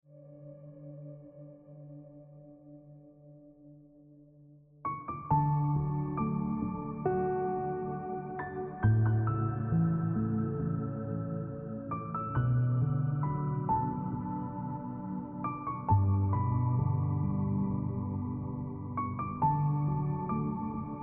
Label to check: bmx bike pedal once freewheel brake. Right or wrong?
Wrong